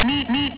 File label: meep meep